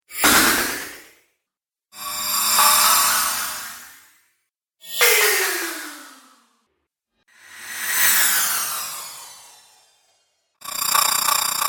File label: Magical Impact Accent Collection; Magic Sound Effects